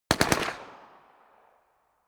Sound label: smg uzi 45 distant burst